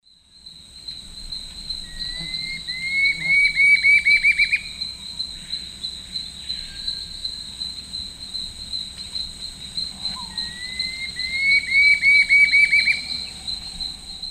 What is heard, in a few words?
Campylorhamphus procurvoides, song; S. America; Eastern Lowlands